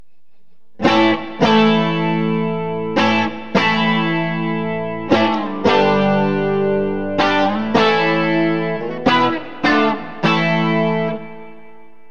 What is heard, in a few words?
emg81 bridge clean, burny fugly clips